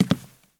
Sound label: Step Wood